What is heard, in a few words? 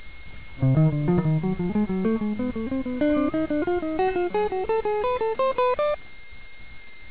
sym dim scale 3rds